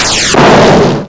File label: MISSILE